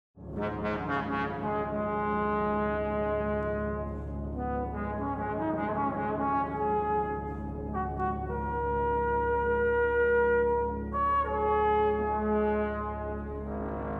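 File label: trombone cad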